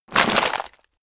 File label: stone gravel clatter